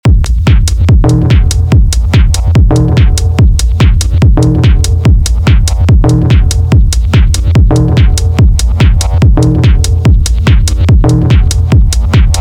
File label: techno; weeklybeats 2024